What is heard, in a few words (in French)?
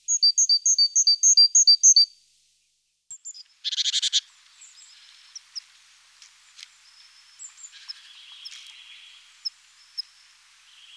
mesange charboniere; TOULON; PRESQUILE